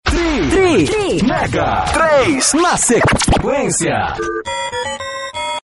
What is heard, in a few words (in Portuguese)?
vinheta